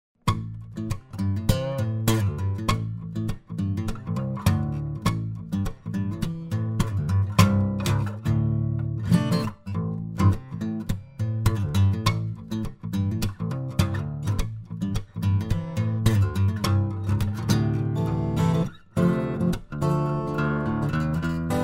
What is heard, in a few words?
baritone, steel string flattop guitar; mccollum